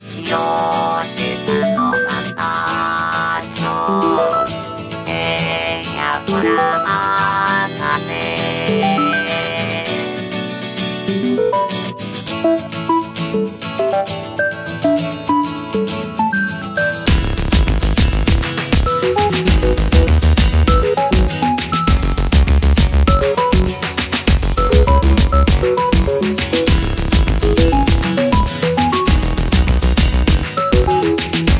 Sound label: kaossilator